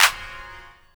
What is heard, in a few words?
CLAP, Drum & Bass, 170 BPM; Ueberschall; Drum & Bass Flash Trackz